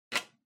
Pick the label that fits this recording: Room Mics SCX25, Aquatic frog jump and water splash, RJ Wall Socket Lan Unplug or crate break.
RJ Wall Socket Lan Unplug